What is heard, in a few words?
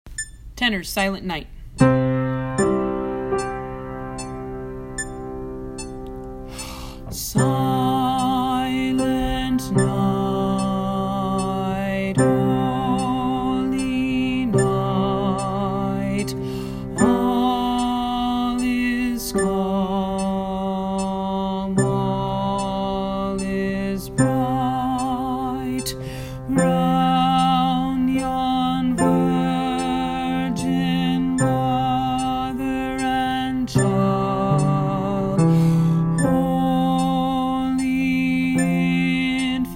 tenor silent night